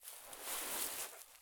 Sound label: prone grass low; Escape from Tarkov